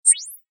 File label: click